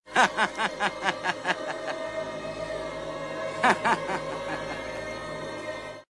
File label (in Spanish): risa malvada